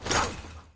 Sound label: armor rattle; smite; vulcan